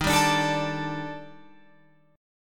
Eb Major 7th Flat 5th, Guitar Open F; EbM7b5; strummed

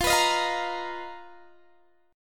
B Major 7th Flat 5th F, chord shape

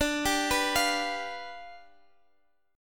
G 7th D

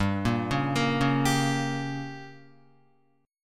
G Diminished, Guitar, chord; arpeggiated; 4F,4A,5C,5G,5C,6E; 2,1,1,3,1,3; JGuitar

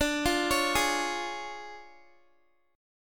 D Minor Major 7th Flat 5th, down, Guitar; DmM7b5 arpeggiated; JGuitar

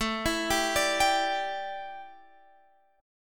A 7th Suspended 4th, chord; A7sus4 arpeggiated

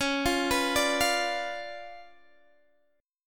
C sharp 7th Flat 9th Guitar Open D, chord

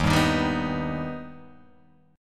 D sharp Augmented D sharp Guitar Open G

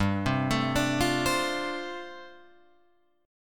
G 7th Suspended 2nd Suspended 4th, Guitar Open F

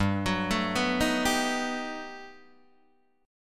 G Augmented Major 9th, Guitar, Open D

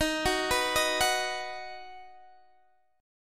Eb Minor Sharp 5th Eb down Guitar DADGAD; arpeggiated

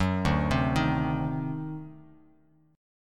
D 7th Flat 9th D down Bass Standard

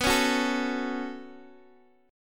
B 7th Suspended 2nd, Guitar, Open D

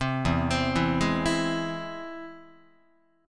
E Augmented, Guitar; E+ arpeggiated; JGuitar

